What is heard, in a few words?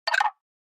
Button Ice Thrown Gla; buttons